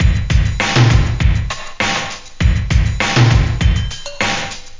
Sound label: breakbeat; World of Sound; instruments; beats